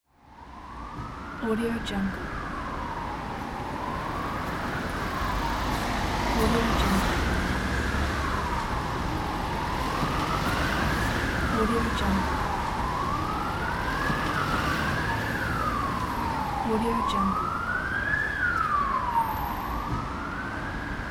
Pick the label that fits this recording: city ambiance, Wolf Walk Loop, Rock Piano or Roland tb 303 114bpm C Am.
city ambiance